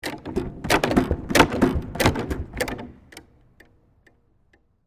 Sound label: Gymnastics Jump, Sports, Gymnastics, Spring, Board, Jump; Several, Times; Miscellaneous Sport